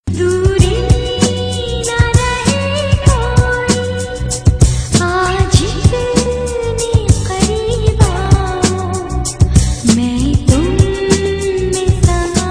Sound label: Romantic Remix